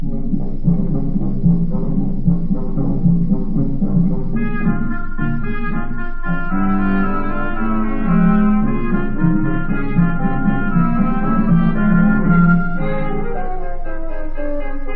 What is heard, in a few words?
instrumental